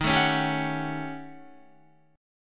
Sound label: Eb 7th Sharp 9th Flat 5th, Eb, Guitar; Eb7#9b5 strummed; 11,12,11,12,13,13; JGuitar